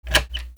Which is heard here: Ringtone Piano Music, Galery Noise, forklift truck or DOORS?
DOORS